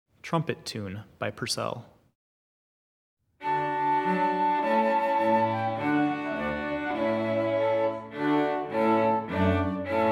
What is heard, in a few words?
Trumpet Tune; Purcell